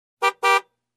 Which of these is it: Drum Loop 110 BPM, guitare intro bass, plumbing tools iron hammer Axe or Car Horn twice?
Car Horn twice